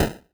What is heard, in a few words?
mage hit; Incantation